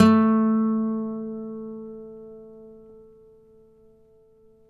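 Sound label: nylon, FLAMENCO, Guitars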